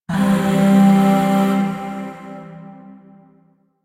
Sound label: Shawzin Pent Maj Chord F; Music; Chords; Warframe; Zariman Void Shawzin; DE